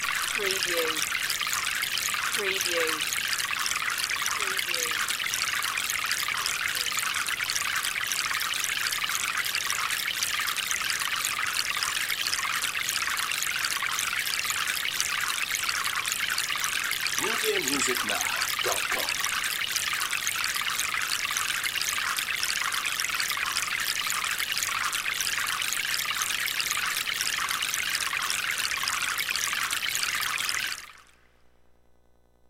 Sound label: Stream High